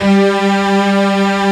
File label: STRINGS G2, Analog, Synth; E-MU Formula 4000 Series Vol. 3; Analog Odyssey; Synth Builder